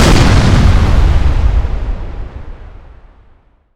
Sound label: Explosions Explode Ground; DCS